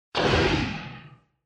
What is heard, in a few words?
Monster